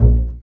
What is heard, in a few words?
BA PIZZ, Orchestra; Best Service; Peter Siedlaczek's Advanced Orchestra